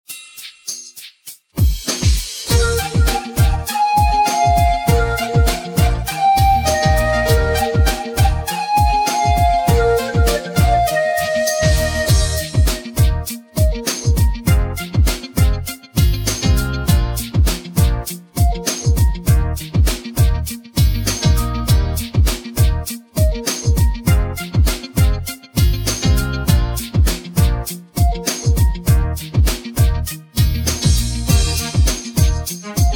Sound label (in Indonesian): Instrumen Minus One Nada Rendah